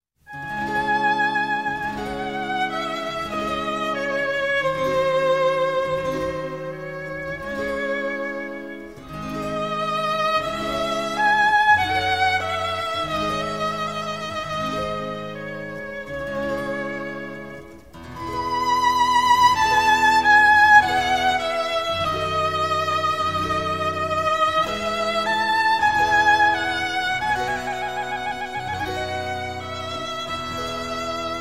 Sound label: Handel Violin Sonata In E Largo; Op. 1-15, HWV 373